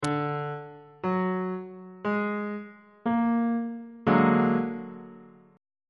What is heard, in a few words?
b flat augmented 7th 1st inversion on bass clef